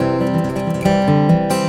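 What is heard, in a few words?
C major, acoustic guitar 140 BPM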